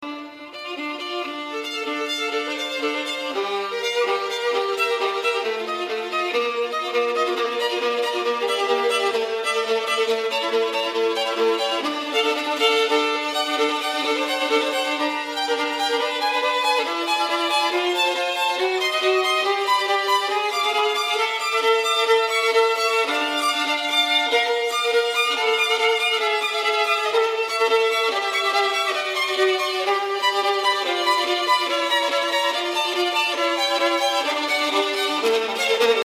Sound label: violin